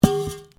METAL PIPE FALL; Materials

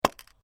AEROSOLE SPRAY CAN REMOVE LID, Can of spray paint; Spraying; Objects; SoundEffects-Foundation1

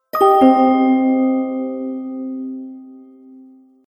Fairy Sadness Sad Twinkle, magic; Magic Sound Effects

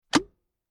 Plastic Container Lid Popping Sound Hollow Single

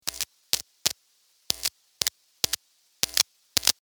sparks, Electric Spark; Several Sparks , From Guitar Cable

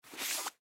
Wallet Leather Slide; Foley; Rustling; Objects; Blastwave

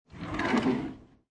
Household, Drawer Open, Wooden, Kitchen Drawer

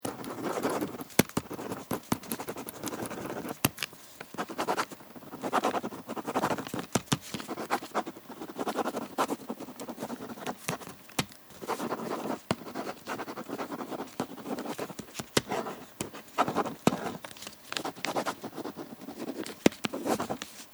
Notepad, Sound of scribbling on paper with pen; Writing; Human

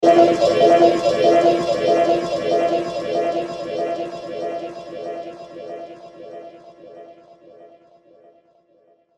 Descend Musical Delay Reverb; Descending Sound Effects; Blastwave

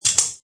Metal Wrench move; Metallic; Lynne Music

